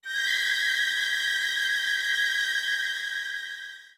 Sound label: Flourish, soundtracks; bolt 2008 pc gamerip